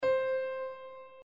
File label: Dynamics ff